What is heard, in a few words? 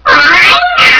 detune